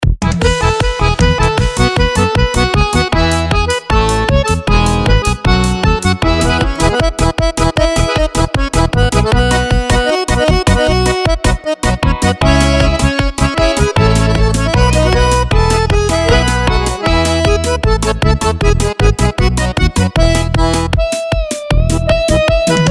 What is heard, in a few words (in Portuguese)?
instrumental